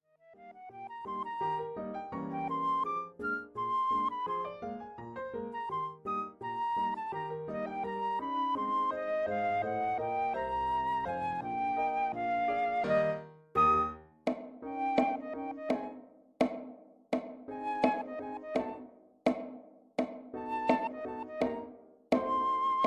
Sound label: Ragtime Dance STOP TIME SECTION